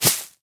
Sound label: Foley Leaf Rustle; frogsong